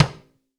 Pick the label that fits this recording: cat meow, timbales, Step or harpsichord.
timbales